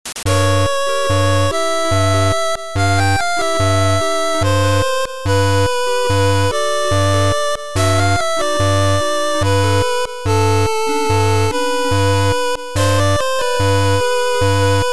8bit Dissonance

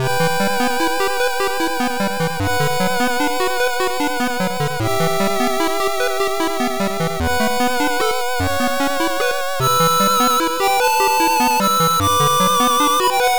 8bit

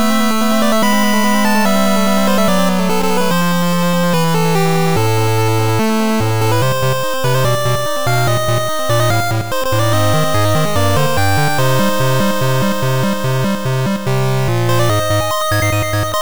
8bit

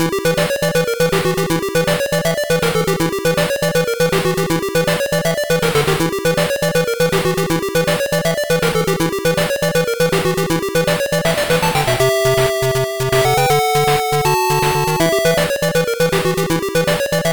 8bit Search The Laboratory

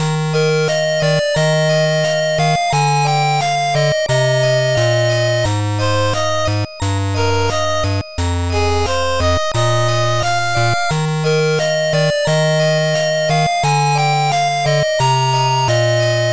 8bit

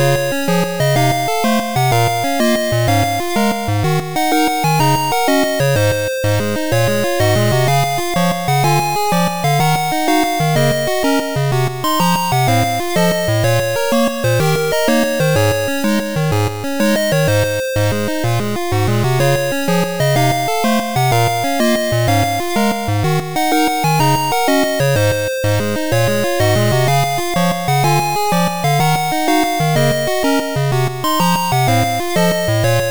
8bit Enjoyable Shopping

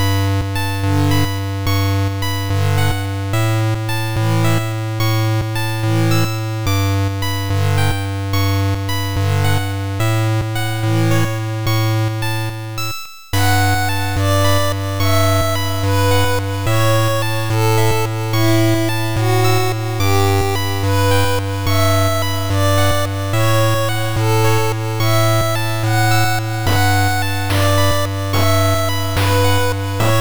8bit

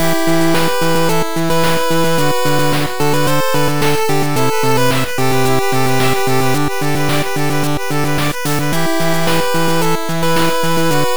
8bit To The Final Battle

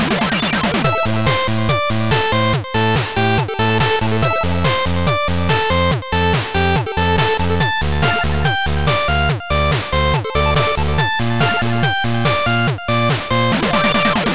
8bit

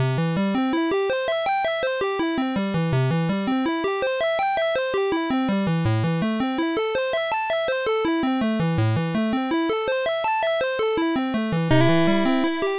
8bit

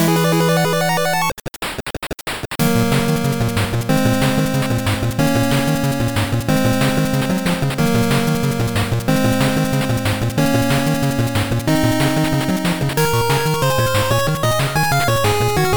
8bit

